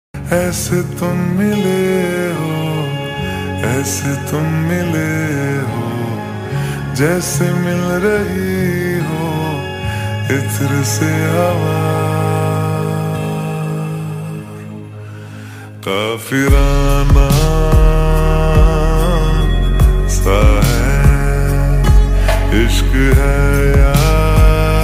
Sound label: slowed reverb lofi flute music ringtone